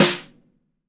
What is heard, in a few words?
METAL, SNARE; BRASS